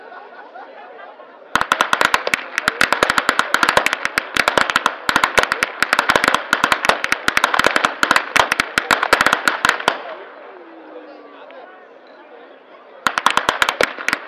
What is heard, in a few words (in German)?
schnalzen, flachgau